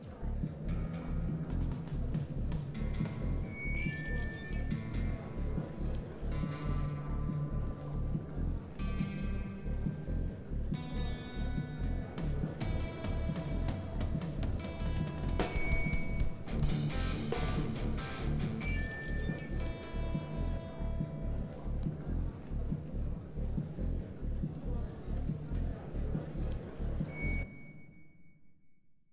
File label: Ambience 140bpm; Princess 64beats